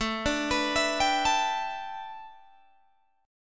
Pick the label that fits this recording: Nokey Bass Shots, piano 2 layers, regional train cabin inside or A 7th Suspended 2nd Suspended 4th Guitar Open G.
A 7th Suspended 2nd Suspended 4th Guitar Open G